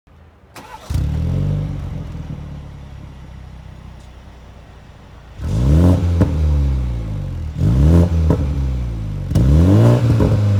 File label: bmw z4 g29